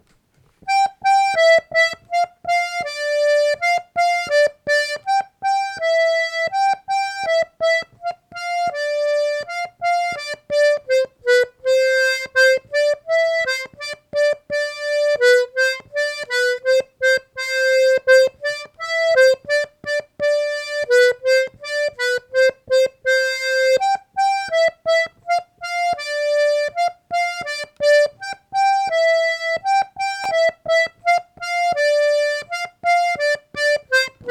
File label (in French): polka